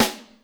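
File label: Snare Rim Shot, Alesis D4 drum machine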